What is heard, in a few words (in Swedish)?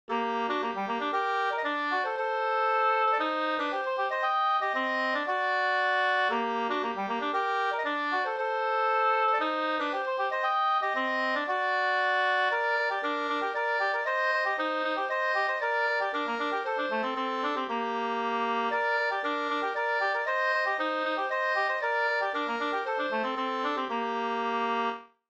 polska Moll